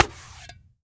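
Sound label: high bongo; Cuba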